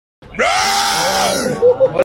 grito abuelo

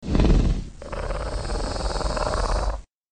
ronroneo

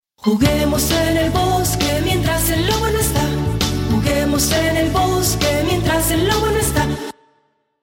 juguemos en el bosque

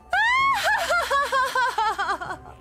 risa, laugh; ahri star guardian

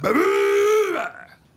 bocina

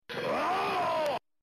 grito; haggar; final fight

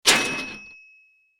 timbre registradora

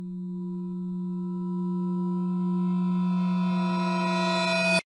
suspenso

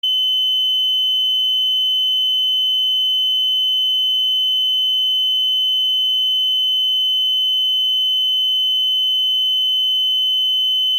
monitor cardiaco

